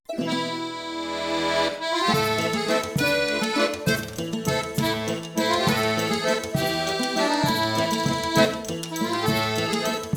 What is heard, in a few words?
Pirate Honky tonk; anno 1503 gamerip